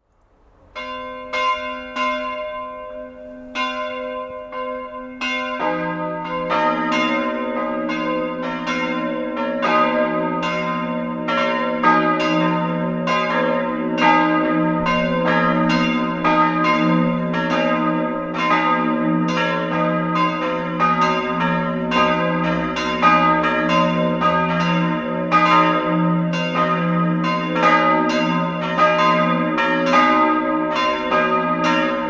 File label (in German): Greding, Glocken